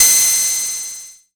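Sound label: cymbal, Korg MS-20 Drums; Polyend; Simulacrum Sounds; 1 Shots; Synths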